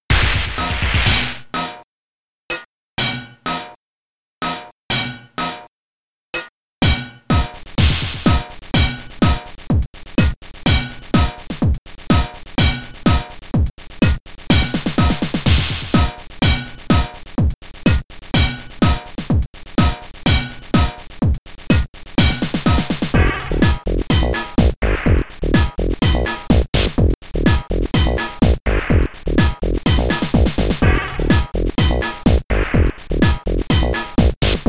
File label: Stage BGM Small Mine; Catch 'em; Amiga